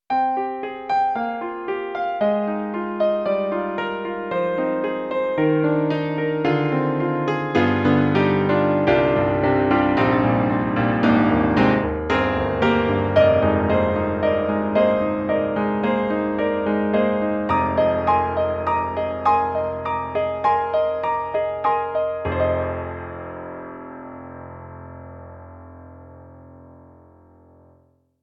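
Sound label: Roland SonicCell Ultimate Grand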